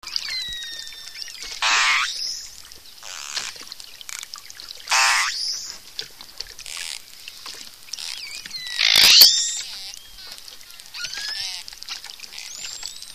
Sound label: pilot whale; dolphins